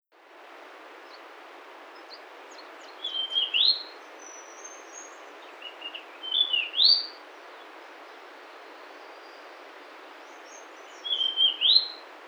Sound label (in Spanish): Arañero Coronado, Basileuterus coronatus; aves iguaque villa de leyva y sus alrededores